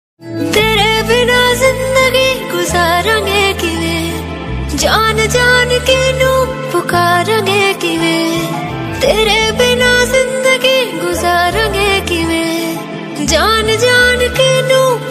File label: Sad Female Song Ringtone